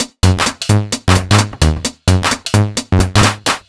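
130 bpm no bass drum, combi loops, nu dance electro; Zero-G; Soundclash; Chicago Jock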